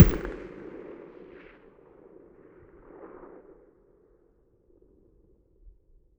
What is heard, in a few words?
mg muzzle mono very far, weapons stationar